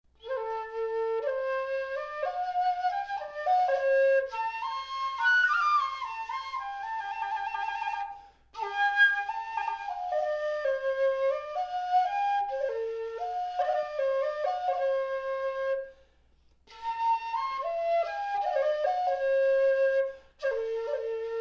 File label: flute